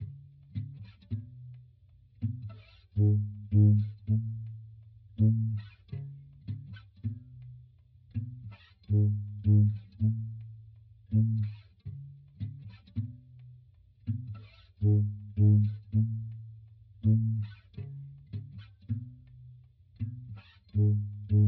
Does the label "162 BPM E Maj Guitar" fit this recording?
Yes